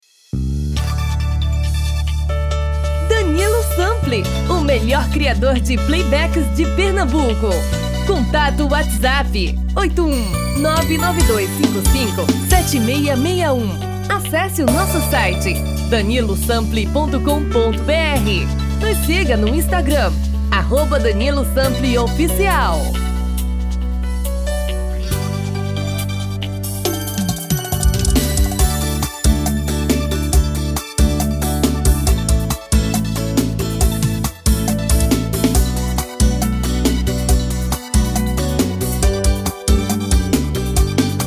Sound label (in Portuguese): Brega